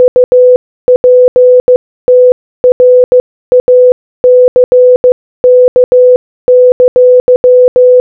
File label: UP TRACK Morse Code